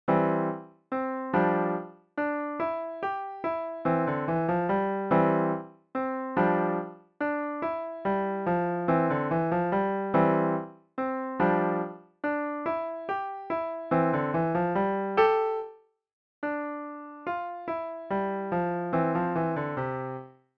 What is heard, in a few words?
pop goes the weasel piano solo